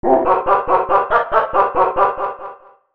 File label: splash